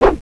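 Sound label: knife pickup; gunslinger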